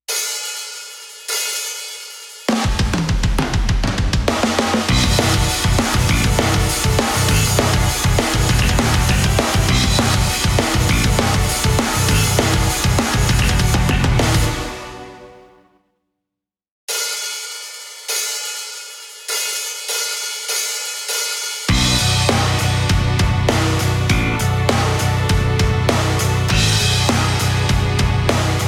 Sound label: karaoke